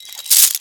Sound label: player bigjump jingle; cliff; Call of Duty Modern Warfare